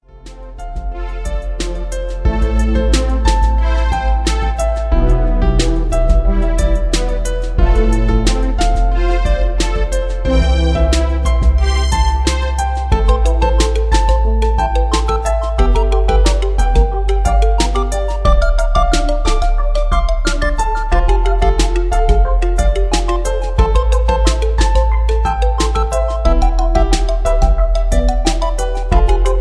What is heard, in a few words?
Electro Chill